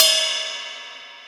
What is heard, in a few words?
normal hit whistle